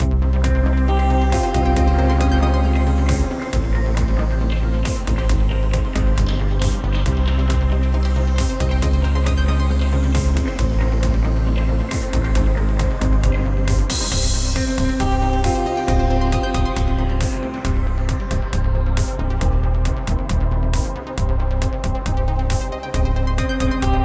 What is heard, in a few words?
Base Music Loop; Action Ops Snow And Sable